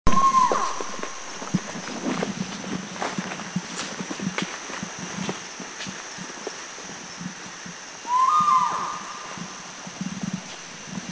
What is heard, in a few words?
Spot-bellied Eagle Owl, Laos; Spot-bellied Eagle-Owl 2